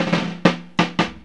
FILL 90, Funky Elements LOOPS; Zero-G

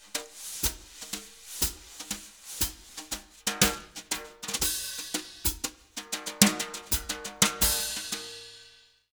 Jazz Latin Drumloops; USB Soundscan vol.08; AKAI

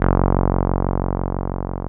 TB-303

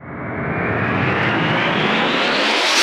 Reverse Fx; musicradar

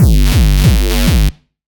Thumpy bass; neuro; GranularSumo Neuro Bass Pack 01

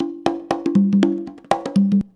CONGA 119, Modern Percussion Loops

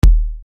Bass Dr, EuroDance Bdrums

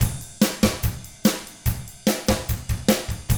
rock drums

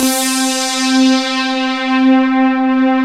SYN, SYNTHS; Pro-Rec - Synthsonic

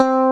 EG MUTE C3; AKAI S-Series CD-ROM Sound Library VOL-2

